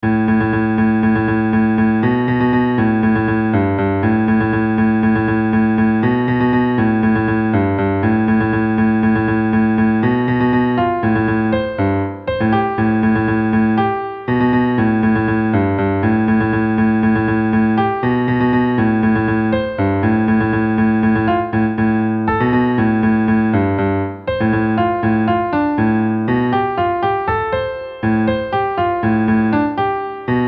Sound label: mel 2bar; music vae